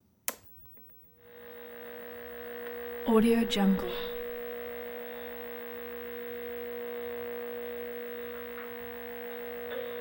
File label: baby monitor